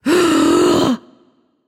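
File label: breath, female voice; smite; voicepack; hera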